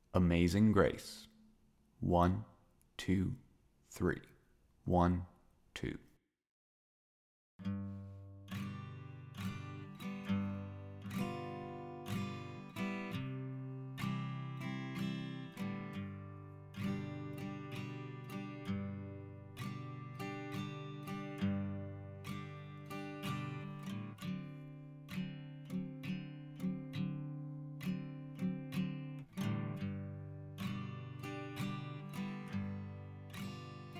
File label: Amazing Grace Chords 65BPM